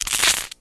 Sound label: Toaster; Rabbids Multiverse